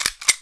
weapon; Bondsman Reload 2

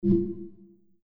hover; hovering over UI button

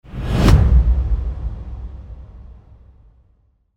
pop; ranked splash text

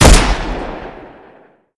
weapon; Lunar Eclipse

